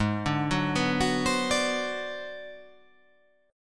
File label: G sharp 7th Sharp 9th Flat 5th G sharp down, chord; G#7#9b5; arpeggiated; JGuitar